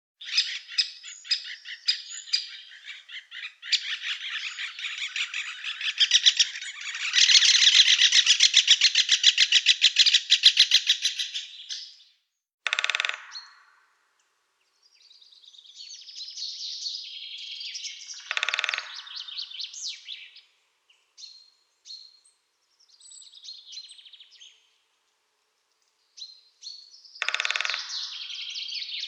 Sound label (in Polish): dzieciol